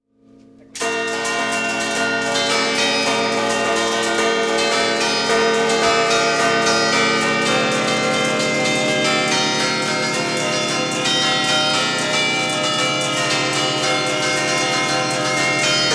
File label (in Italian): campane; Giuseppe Signori ( all'accompagnamento); "Marcia del Vapore", dal soprannome del compositore, il campanaro Arturo Zenoni di Leffe, scritta nel 1950